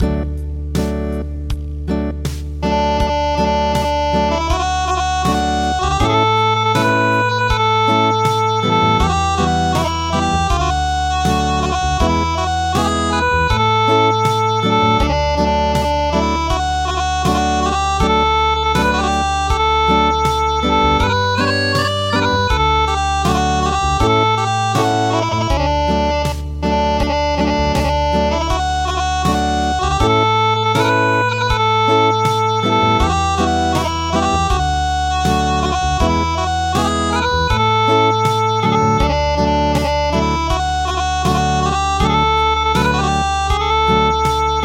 Acoustic

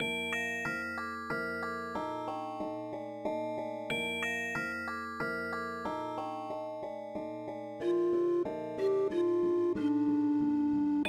gamelan flute; midi traditional

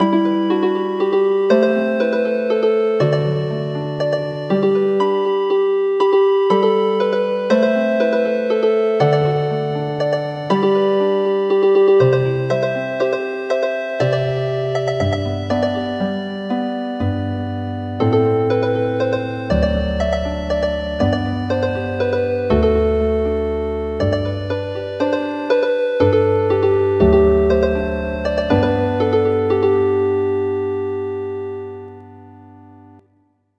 Healin Harp; Muran of England

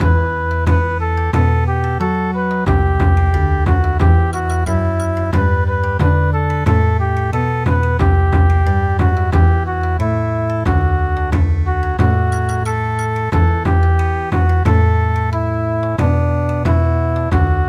Bon Odori

